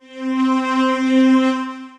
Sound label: Strings Organ Layered, String Machines, Roland RS-09; Ableton; Factory Packs; Retro Synths